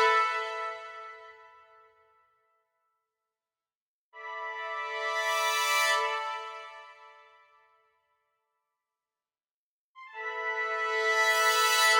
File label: Trumpet cresc; Trumpet cresc a; crescendo